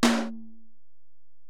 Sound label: Tom, Alesis DM-PRO, Drum Machines, Toms